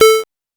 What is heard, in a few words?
PO Synth A; SYNTHS; CHIPSHOP DELUXE; CHIPSHOP HITS